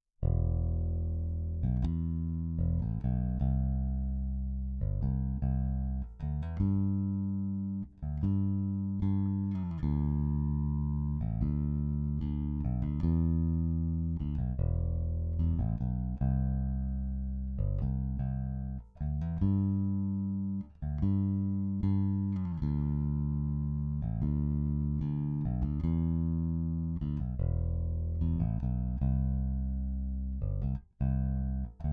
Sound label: radial prodi bass 27db